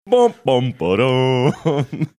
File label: attempting price is right fail horn